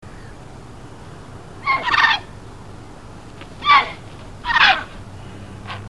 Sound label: Ardea cinerea, Airone cenerino